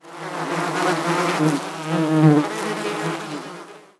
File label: bees; inferno